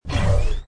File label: door close